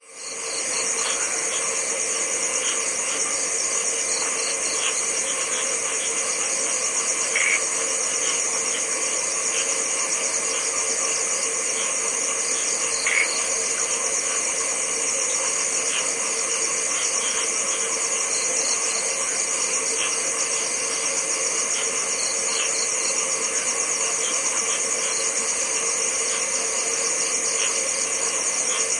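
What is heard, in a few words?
atmospheric sounds Bali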